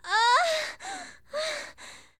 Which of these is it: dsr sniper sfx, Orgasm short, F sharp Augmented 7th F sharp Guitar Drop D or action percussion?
Orgasm short